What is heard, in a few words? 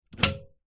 household night light remove from socket